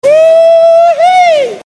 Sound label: woohoo; svencoop; FZSaySound